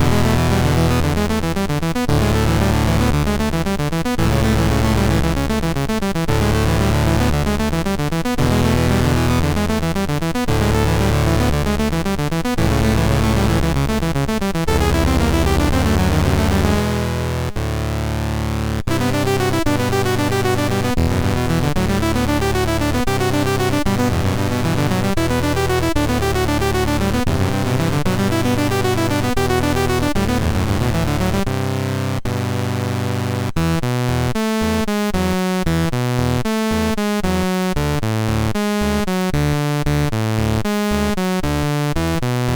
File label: MOS6581R3, sid; F-14 Tomcat